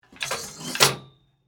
Hanger Slide Hotel, Household, Hanger, Slide, Hotel, Closet; Bedroom Sound Effects; BlastWave